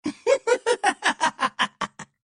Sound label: spy laugh evil; Adachi